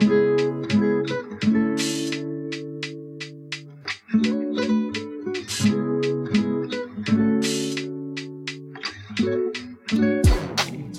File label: lofi